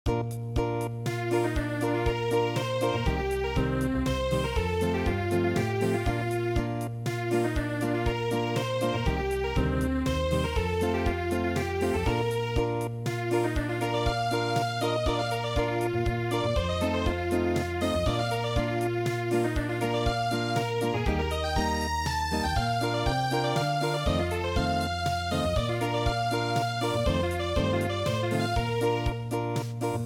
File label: Unplugged; Erzherzog Johann Jodler